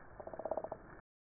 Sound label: damselfish chirp